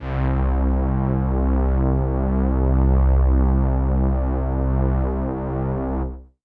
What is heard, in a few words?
Pad C, Synth Prophet One Shots; Electronisounds